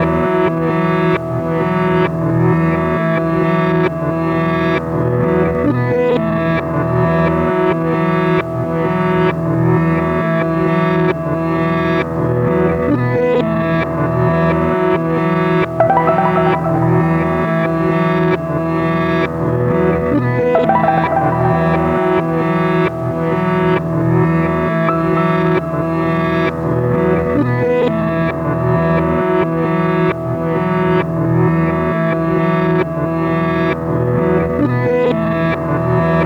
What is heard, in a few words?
tape loop